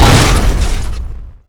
car impact

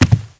foot carpet, footsteps

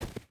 footstep hide snow run; Rust

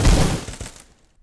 Catapult Hit; Civ4 beyond sword

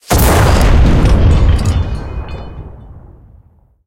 cannon 115mm shot, cannons tanks

